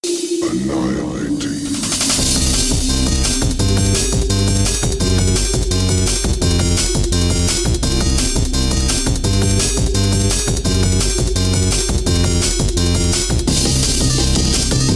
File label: win music old; deathlegends